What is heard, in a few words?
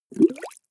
bubbles medium